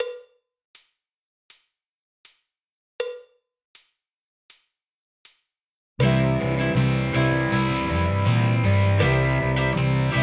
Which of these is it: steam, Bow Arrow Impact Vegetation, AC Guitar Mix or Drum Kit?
Drum Kit